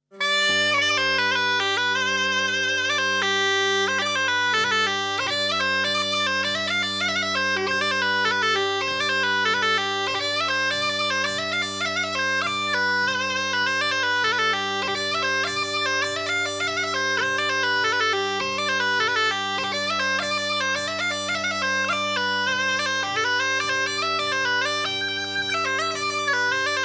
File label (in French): Scottisch; Scottisch de Chabrely